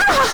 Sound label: Hurt